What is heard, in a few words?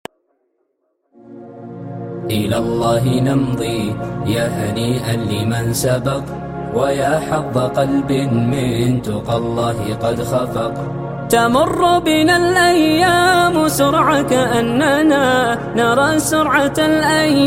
ISLAMIC SONG RINGTONE